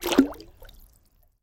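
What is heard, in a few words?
water drop strong soft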